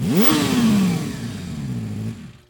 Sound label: Ferrari F50! Exclamation; 250 Desktop Themes